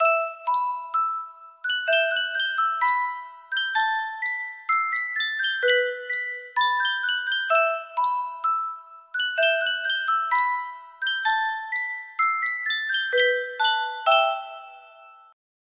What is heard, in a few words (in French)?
Yunsheng boite a musique